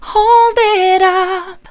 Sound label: hold it up soft, vocal